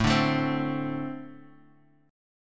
A-Minor Double Flat 5th A Guitar Half Step Down

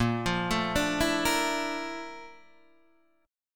Bb Major Sharp 11th Bb down Guitar DADGAD, chord

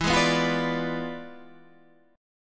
E 7th Flat 9th Guitar Standard, chord; E7b9; strummed

